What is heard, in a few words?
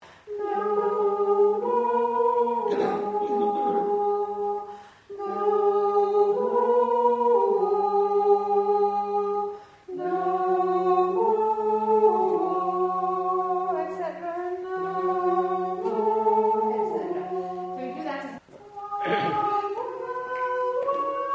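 Soprano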